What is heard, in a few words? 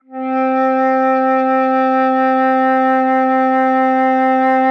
Flute C4